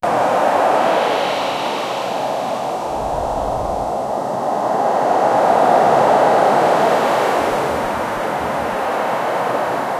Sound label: wind coastal breeze ocean